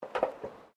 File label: FOOTSTEPS WOMENS HIGH HEELS ESCALATOR STEP; Footstep Foley; Human